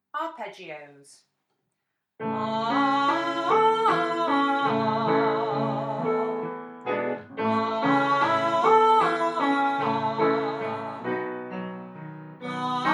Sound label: arpeggios